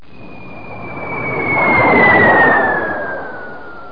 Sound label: bomb drop; 5thfleet